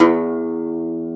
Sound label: Segundo Domra E1; Planet Earth; Digital Sound Factory Planet Earth